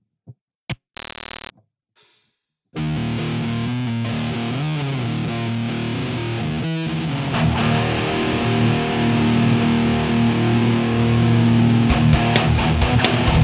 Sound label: Riff